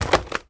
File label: books fall on floor; household